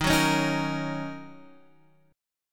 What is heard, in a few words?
Eb Minor 7th